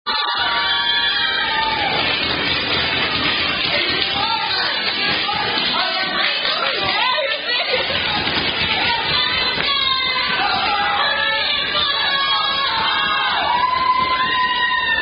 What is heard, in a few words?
Drinking Game; Thumper!